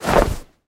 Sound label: Movement Flying whoosh; Talking Tom Gold Run